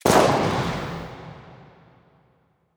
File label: p250 distant, weapons; Counter Strike Model Pack; CS:GO